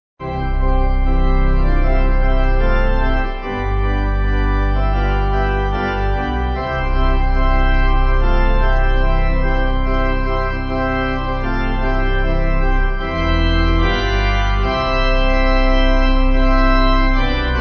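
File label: pipe organ hymn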